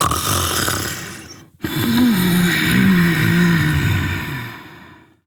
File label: Snores, Mother, Vocalizations; Warframe; Deimos